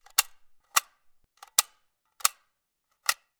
gun ak 47; still north media

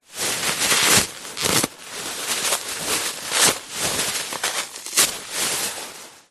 household toilet paper mass rip tear; zapsplat

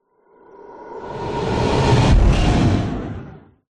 sound design whoosh heavy intense loud impact; zapsplat

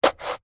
plastic disposable cup stab with knife

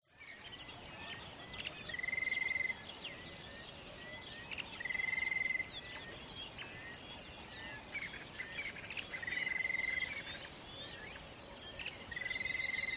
ATMO BUSH Water Hole Early Morning; smartsound

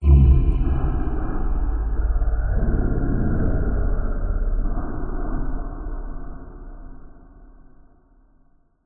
horror ghostly pass

